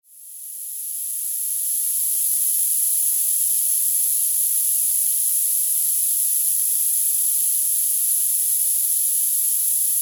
11 kHz Masking Noise; Tinnitus; Tinnitus Pacifier

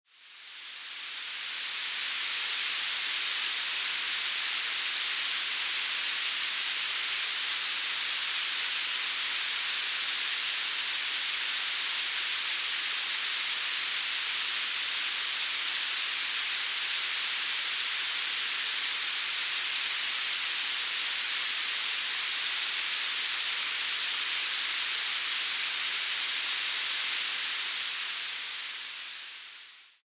Sweeping Static Noise 8500 to 11000; Tinnitus Inverter V2

